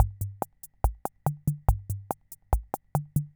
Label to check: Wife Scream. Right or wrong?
Wrong